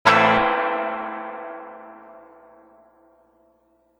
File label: reverb tank 2 spring medium quick strum